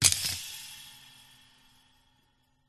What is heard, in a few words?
ancient game metal spring release hit gear open slide texture